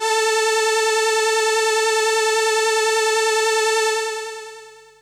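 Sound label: synth A1, Ensemble, FAIRLIGHT; Best Service ProSamples vol.55 - Retro Sampler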